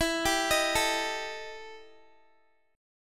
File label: E Minor Major 7th Double Flat 5th, Guitar; arpeggiated; JGuitar